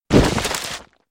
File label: Tree Throw; Tiny